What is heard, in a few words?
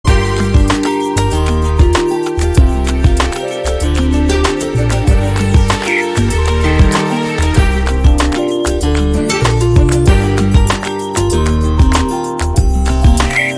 chill out